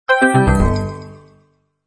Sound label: level failed; sonic racing 2